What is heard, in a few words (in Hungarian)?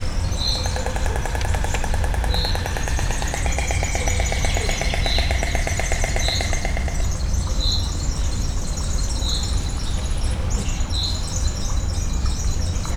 reggel a tóparton, fehér gólya; szegedi zoo 2011